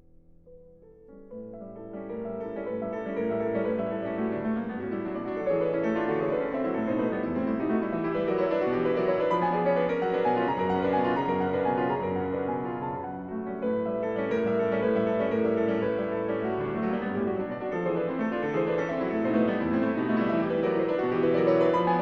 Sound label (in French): En Re Majeur Allegro Molto, Piano Solo; Chopin